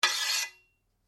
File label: Blade Lock Scrape, Sword; Foley sounds for melee weapons; Swords; Combat